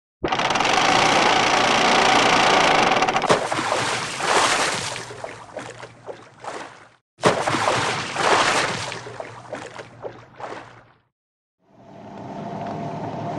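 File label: anchor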